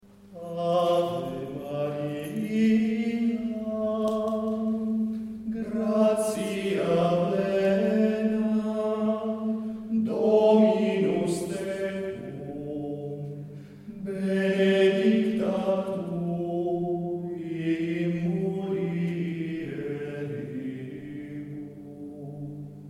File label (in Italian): Ave Maria gregoriano